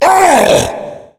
tank pain, zombie; Left 4 Fortress

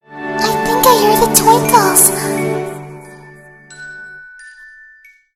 twinkles; mlp; snowdrop

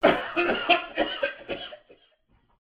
hurt

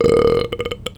burp

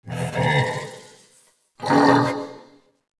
wretch tunnels amb idle; A Machine for Pigs

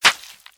human hit

